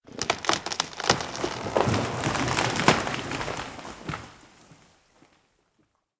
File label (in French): Crak Pousse; Puddle Plus